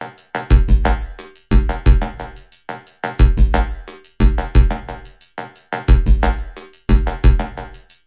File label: 178 bpm phase shift latino, drum loop